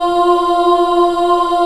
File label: F3 CHILD OH, Choir; USB Soundscan vol.28; Acoustic & Synth; AKAI